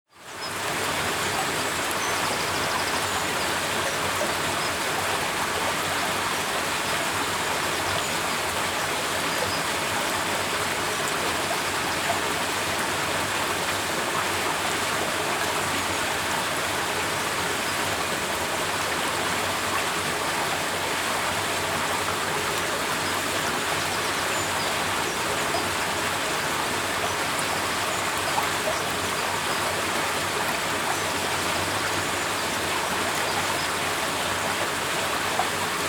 little water stream flowing away while birds chirping if you listen carefully